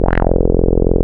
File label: 101 BS2, Vintage; INIS - Vintage